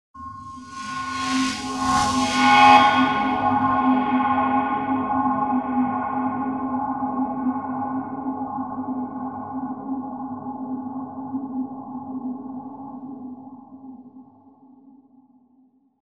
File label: Upsynth